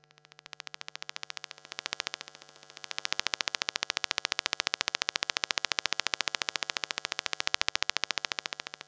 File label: Electromagnetic Fields